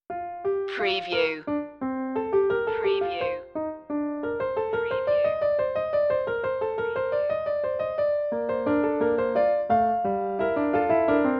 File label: clavier fugue; bach; the well-tempered; prelude and fugue; bwv 866